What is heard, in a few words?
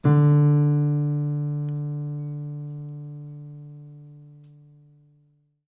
piano sample